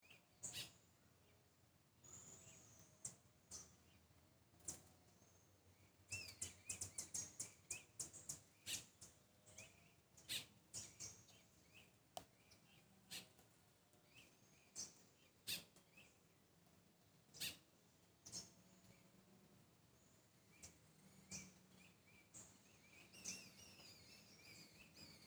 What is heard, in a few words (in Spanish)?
aves caminata